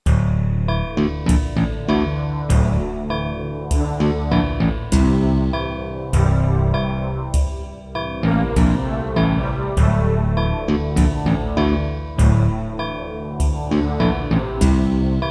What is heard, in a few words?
Yamaha XG SoftSynth S-YXG50 software; soundcardrecordings; Abuse